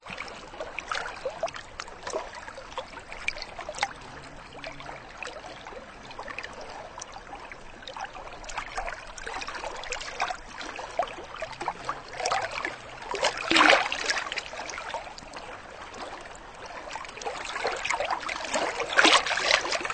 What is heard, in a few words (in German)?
wasser; schweden 2002